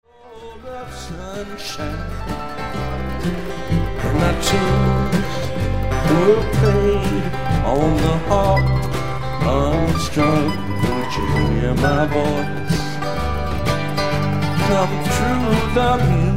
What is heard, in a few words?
acoustic